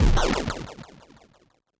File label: explosion lowbit long